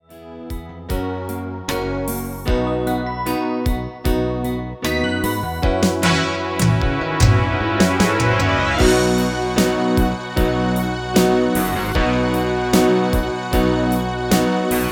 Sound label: MIDI